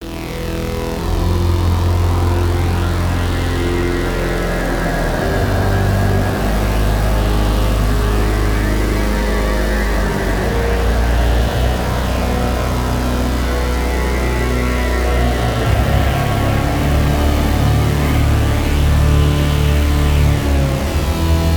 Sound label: Spooky Tension Building Synth; CUES for film TV games etc